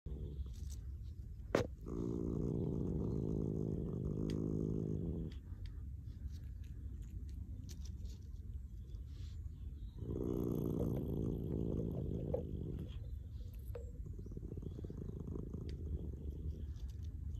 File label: pallas's cat